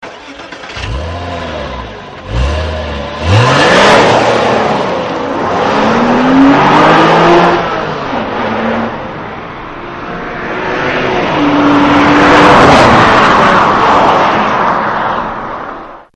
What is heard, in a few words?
Porsche Boxster S